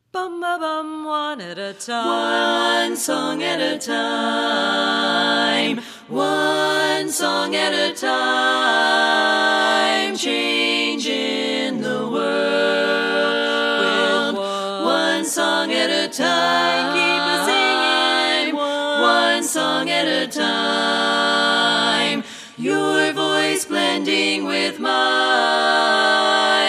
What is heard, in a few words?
Tenor Omit